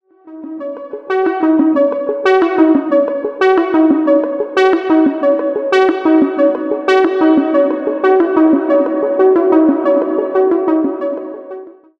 room norm

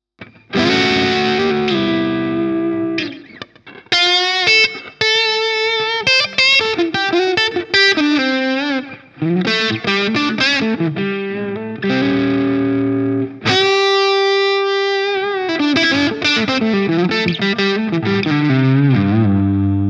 Custom 24 Hals zerre; PRS SE; Stealth Quilt LTD; peavey

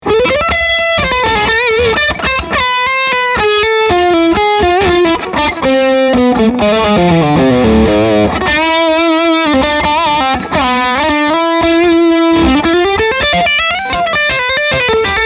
Lead High Gain

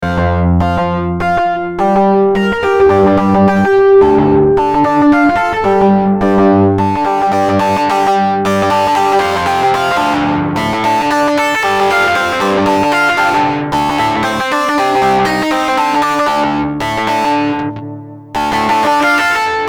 system8 distort FX

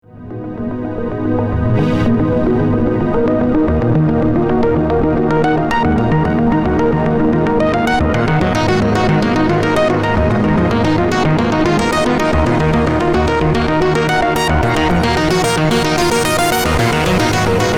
Prophet 5 Arp; ATTACK 10; RELEASE 12

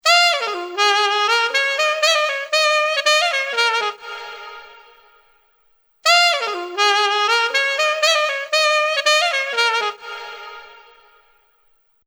Ducking Reverb

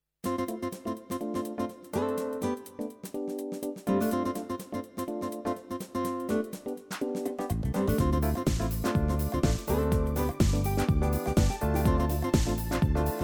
DGX650 Style 3

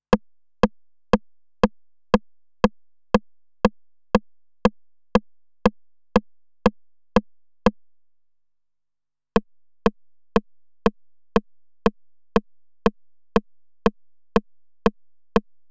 RD 9 vs TR 909 RIMSHOT

